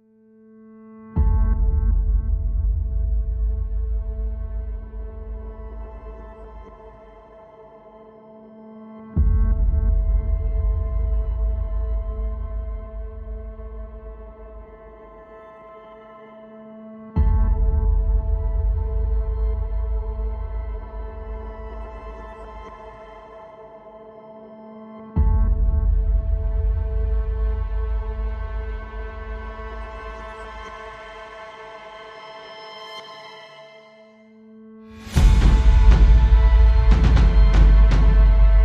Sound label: Drums Synths and Brass Accompaniment Only